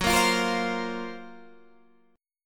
Gb Major Sharp 11th, Guitar Standard; GbM#11 strummed; JGuitar

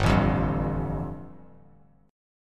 G 7th Suspended 2nd, Bass Standard; strummed; JGuitar

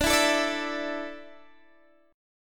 D Suspended 2nd D, down, Guitar DADGAD

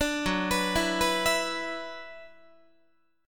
F sharp 7th Suspended 4th Sharp 5th, Guitar; F#7sus4#5 arpeggiated

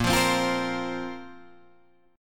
A sharp Suspended 2nd, down, Guitar, Drop D

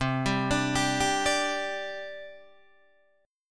C Suspended 2nd, C, down, Guitar, DADGAD

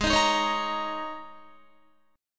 Ab Suspended 2nd Suspended 4th, down, Guitar; strummed; Absus2sus4

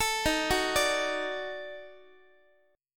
D sharp Minor Major 7th Flat 5th, Guitar; arpeggiated

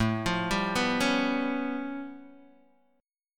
A Augmented 9th A Guitar DADGAD

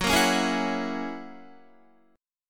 F sharp 9th F sharp Guitar

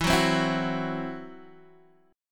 E Suspended 2nd Sharp 5th E, down, Guitar, Open D

